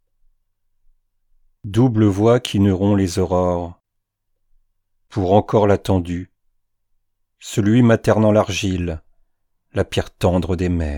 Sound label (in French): Chant De Mort